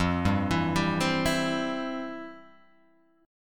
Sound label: F Major 7th Suspended 2nd, F, down, Guitar, Open G